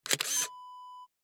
camera shutter

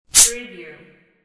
soda fizz

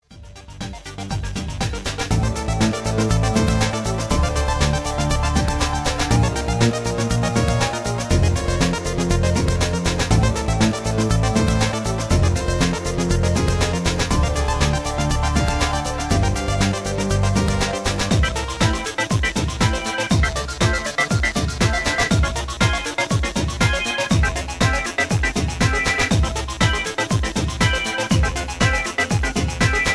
Electro

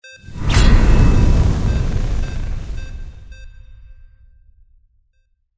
SCIFI MAGIC FIRE RUNE; windy beach audio

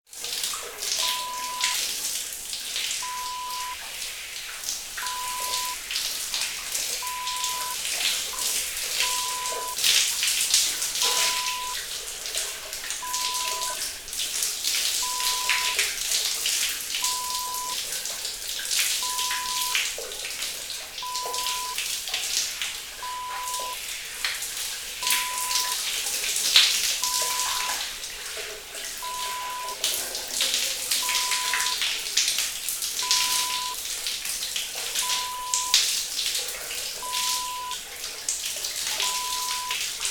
taking shower

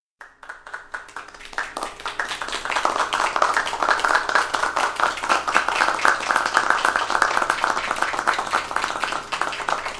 light applause

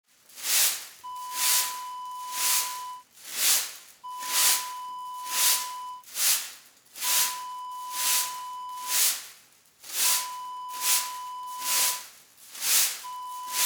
broom sweep

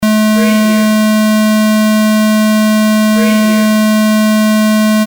beep

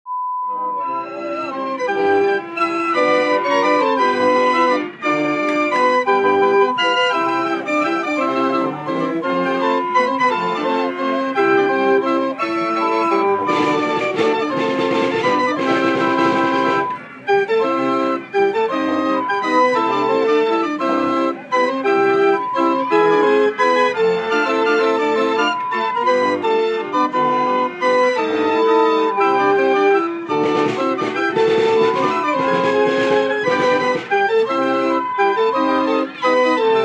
Fairground Organ

